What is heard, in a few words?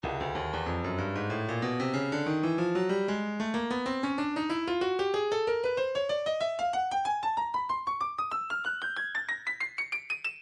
breakbeat 094 bpm; bang up d; Stylus Breakbeat Midi Files